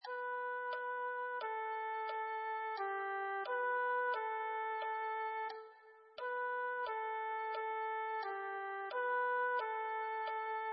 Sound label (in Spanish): mi guitarra invisible